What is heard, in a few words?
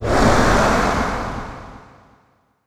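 Distant Abstract Scream Short, monster sounds; The Decline Of Warriors; Monster Sounds Volume II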